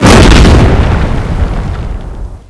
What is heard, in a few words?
rocket explode; XDM